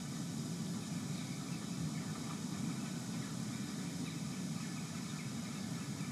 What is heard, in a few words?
Ambience Loop, outdoor